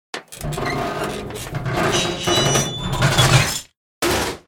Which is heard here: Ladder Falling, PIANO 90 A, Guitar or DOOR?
Ladder Falling